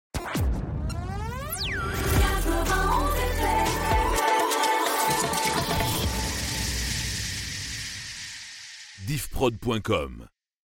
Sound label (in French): Jingle Chante; 91 FM